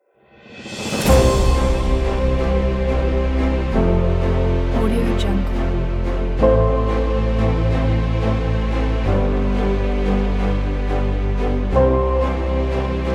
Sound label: wedding music